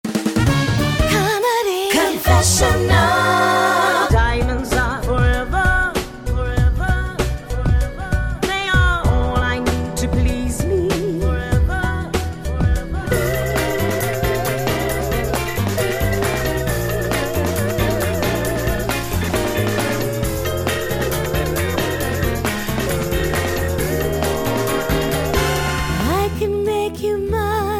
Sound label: jingle